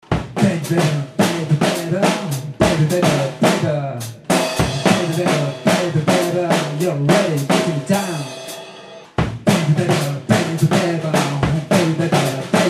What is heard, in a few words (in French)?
voix drums